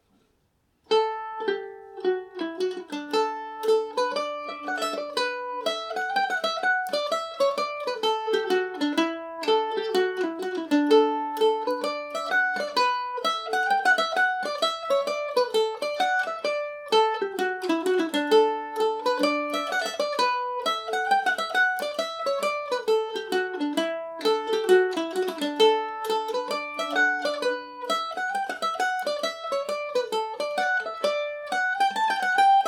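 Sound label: peacocks feather D major, mandolin; G&O #34 mandolin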